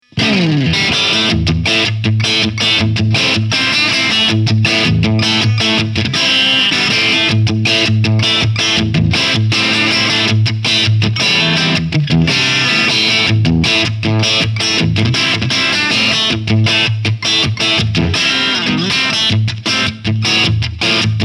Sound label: Hughes Kettner StompMan Crunch